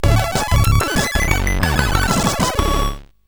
sid CSG8580R5

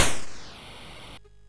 MOS6581R2, sid; Defenders of the Earth

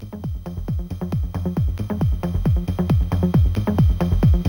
15638Hz CSG8580R5

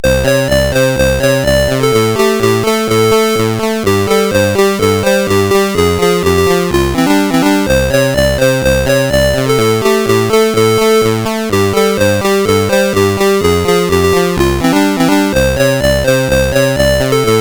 sid CSG8580R5; Omidar

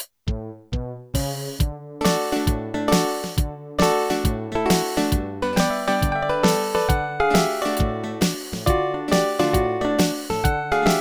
Country; SuperJAM!; Country Demo

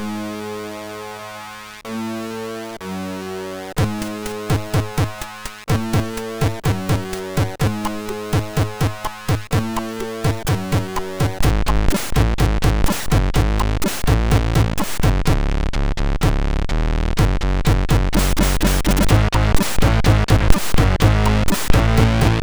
Hard-Soft, MOS6581; MOS6581R2

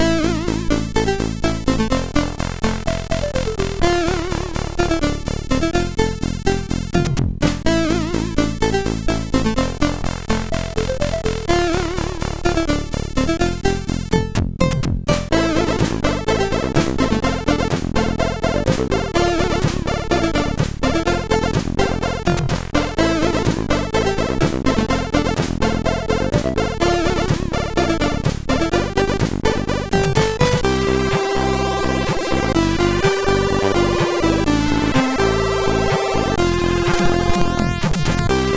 tune, MOS6581R4; sid

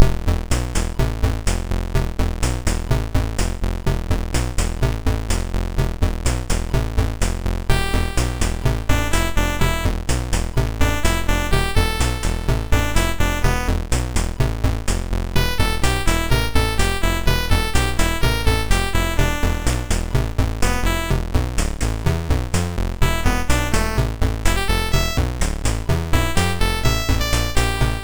Music Box, sid MOS6581R2; Randall; Eight C64 Games in Six Minutes

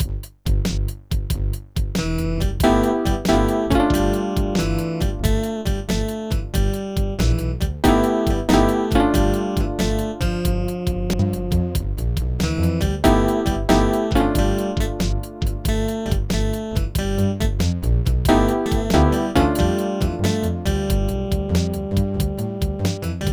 banana shuffle; Master Tracks Pro

